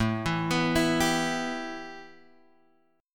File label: A 7th Suspended 2nd, A, down, Guitar, Open G